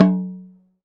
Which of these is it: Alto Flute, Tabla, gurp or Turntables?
Tabla